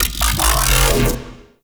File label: Alt Fire Growl; Warframe; Corpus; Tenet Quanta